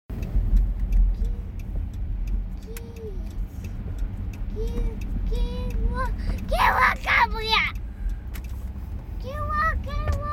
yelling

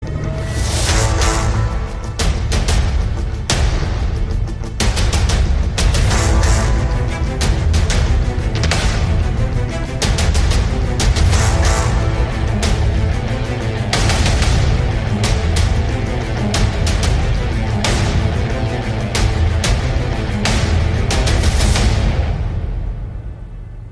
Trailer Music; Solo

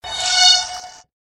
Zinggg; Hoarders

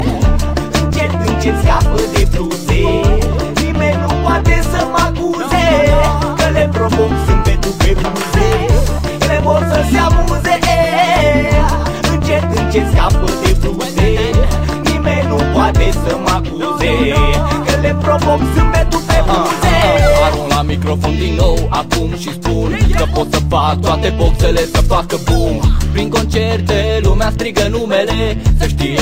Raggae

